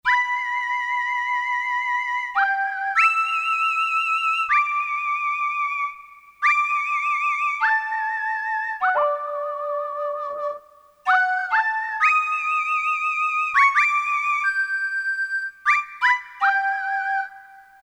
Woodwinds, Korg DSS-1

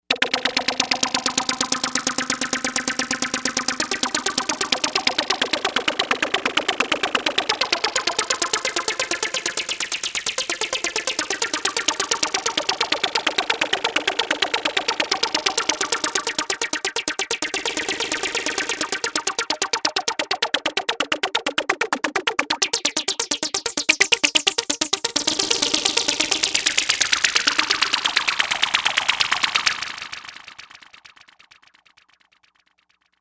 Drip Scratch; Novation UltraNova; Factory Patches

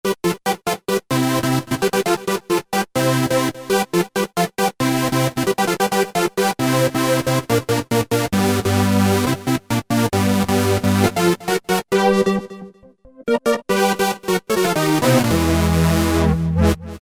Korg microKORG M3rd Chord; Factory Voices

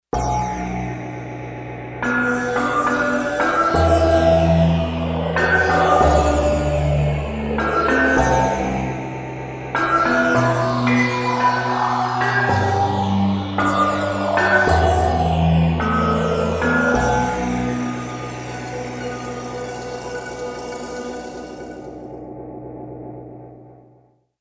Industrial; Digital Synthesizer

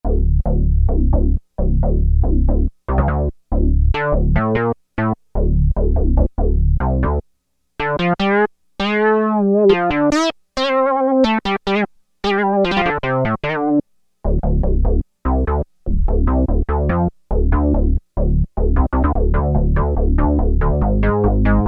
Funk Bass, Roland Juno-60; Factory presets; Factory presets group 2

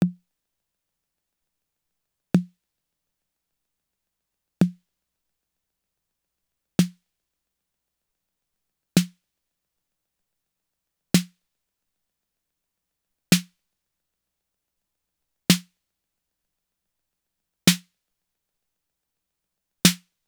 TR-808 Snare Drum; Roland; Instrument samples

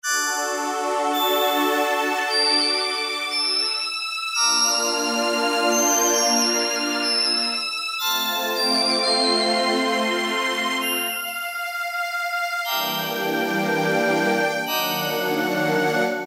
Peace, Kawai K1m

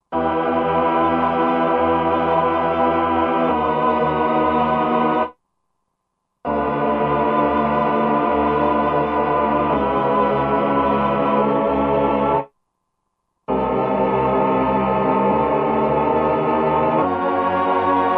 Tron Choir, Roland '60s & '70s; Roland SR-JV80-08

